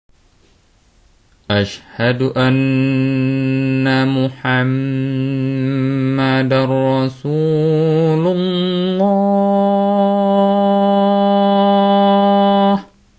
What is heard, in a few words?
Adzan